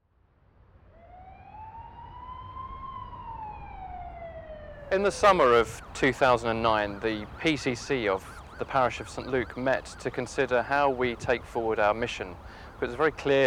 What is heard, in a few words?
Siren Untreated